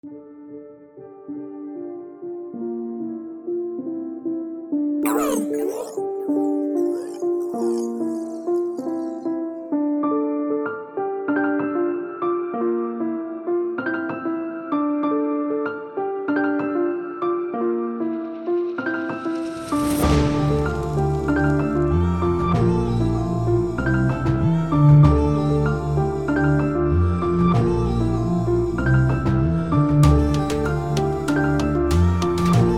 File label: Dm 96bpm